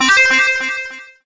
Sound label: unlock; Junkbot